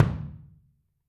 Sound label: Kick, Drums Percussion